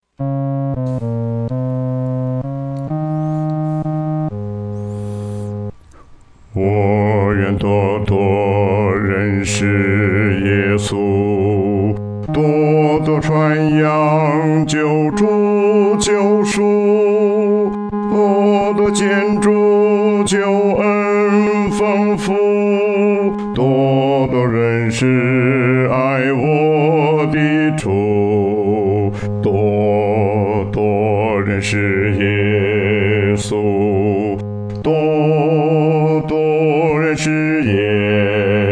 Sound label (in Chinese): 独唱 第四声